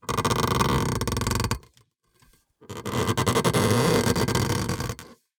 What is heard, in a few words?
Metal Creak; SoundBits; Unsettling Creaks and Squeaks